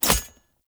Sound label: Throwing Knives Impact Armour; Weapon Impacts; Player; Combat